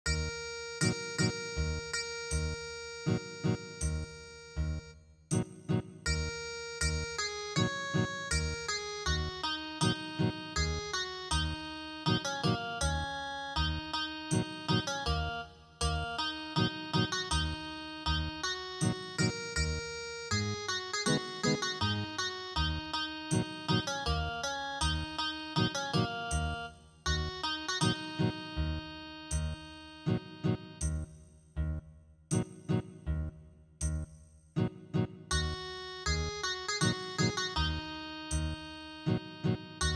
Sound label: Ethnic Guitar; gung cho daeng gi